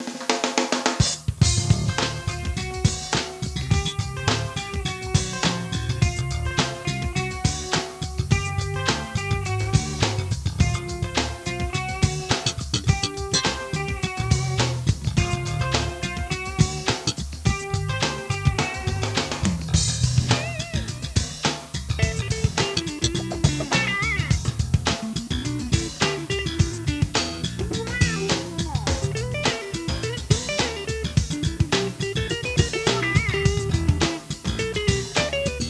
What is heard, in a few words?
GTR